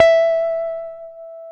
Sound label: E 4 JAZZ GTR; Univers Sons; Jazzistic